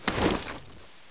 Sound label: awp draw, weapons